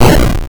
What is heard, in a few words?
retro die, synth